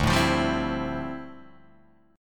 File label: D Minor 11th D down Guitar DADGAD